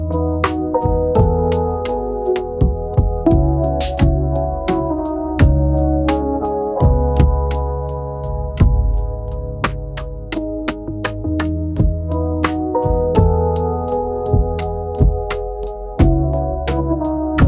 lo fi piece, afternoon

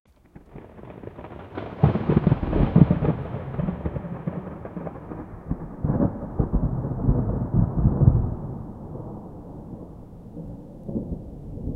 sunny day becoming cloudy; clouds

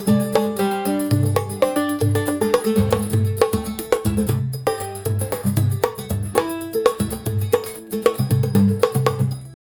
folk music

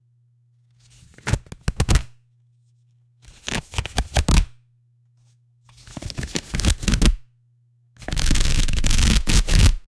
sound for pulling a card

